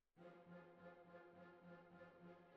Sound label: Cbasses